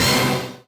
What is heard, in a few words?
Orchestra Hit; puchicon; dsi ds